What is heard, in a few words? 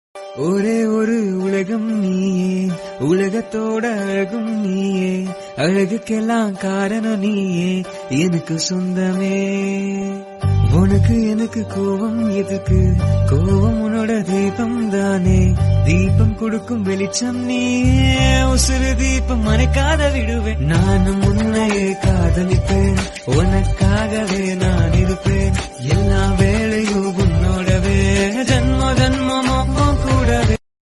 Tamil Song